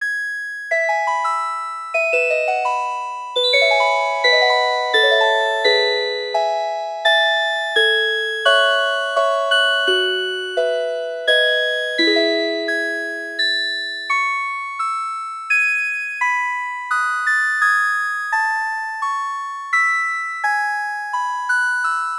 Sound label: wood harp; hiems